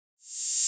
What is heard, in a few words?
Drum Loop - 100 BPM Reverse, RnB; Loop Stems; Atrophy